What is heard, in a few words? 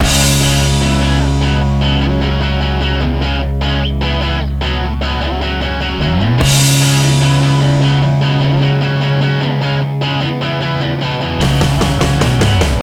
Music Punk; Learn to Fly 3